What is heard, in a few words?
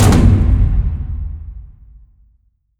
Interface Double Drum Hit; Warframe; Cues